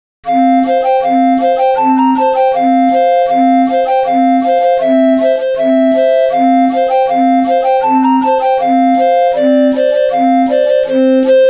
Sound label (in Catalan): Ball Ingles vell (flabiol facil + bombo); festa